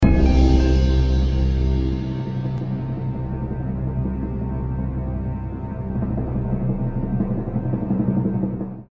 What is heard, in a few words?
suspense